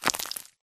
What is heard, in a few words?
bullet light ballistic concrete debris; Titanfall 2